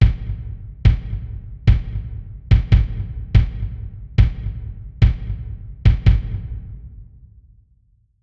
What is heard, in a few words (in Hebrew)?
בס; בניית מקצבים